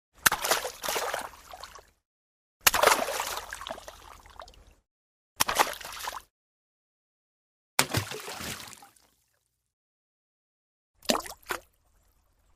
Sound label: Falling fruit in water